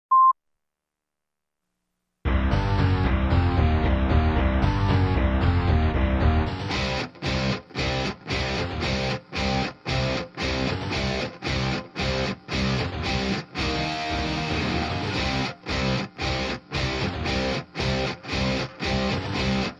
Guitars Only

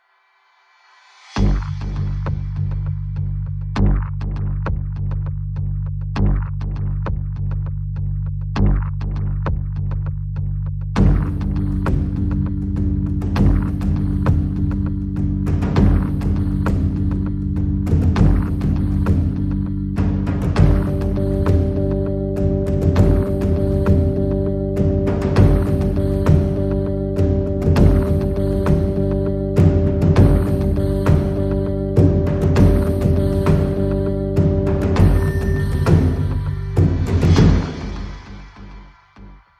Rhythm Only

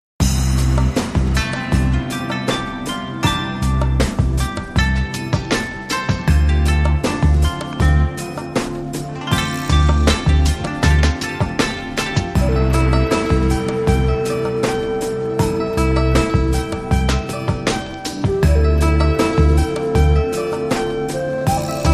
Bento Box Beat

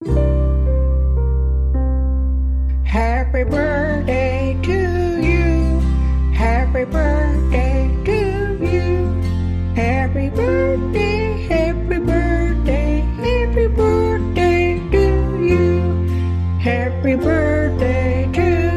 Grandma Vocal Happy Birthday

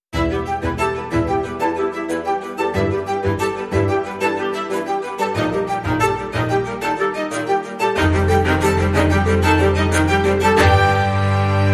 Bubbles

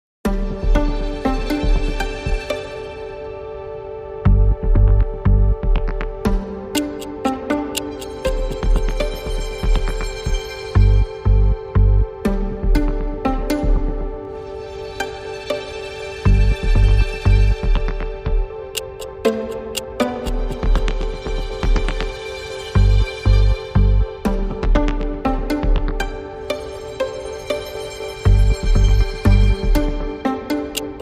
Modern Art

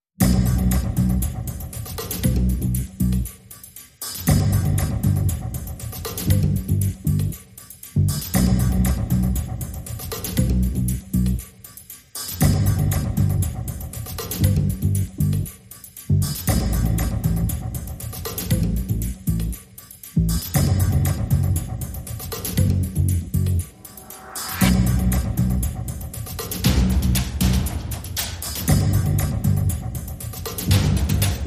No Bass Grunge Mix

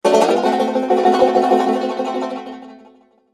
Banjo Dastardly Dude

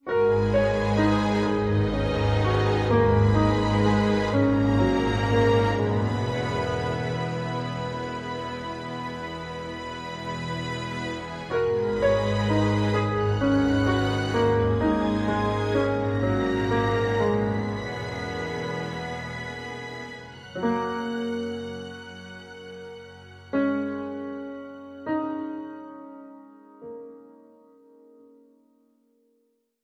Orchestral

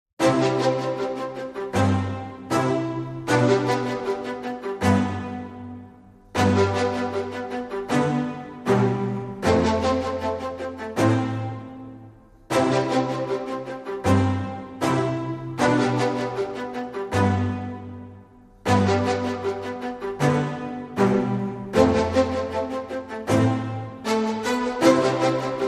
Strings Only

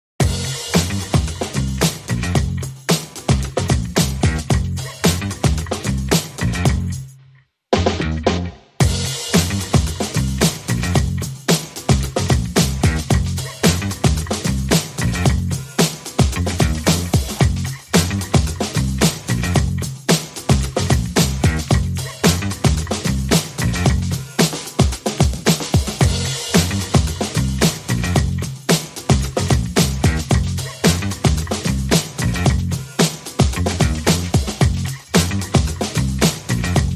Drums and Bass

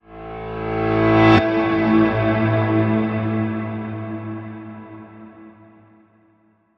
Drama Piano Reverse

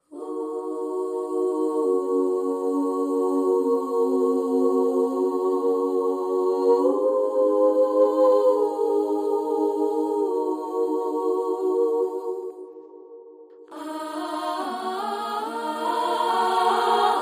Choir Crescendo

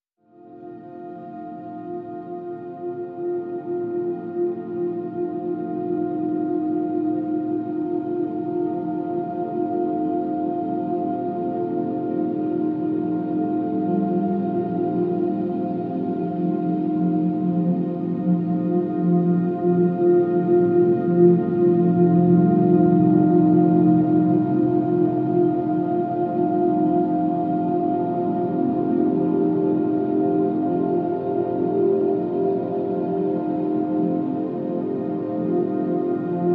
Pads Distant Lights (F)

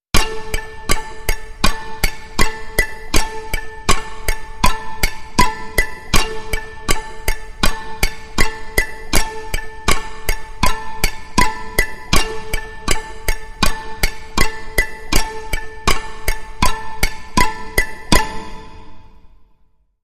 Mandolin Rhythm

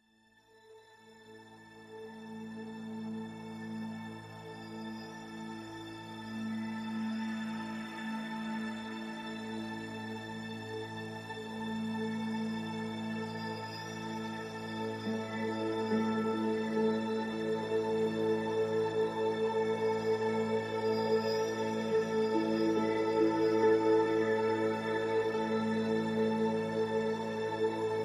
Woodwinds

